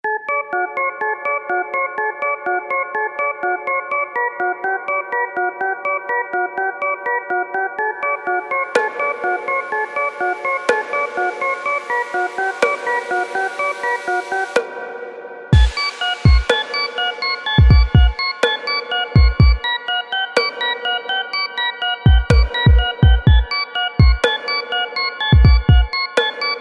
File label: beat ringtone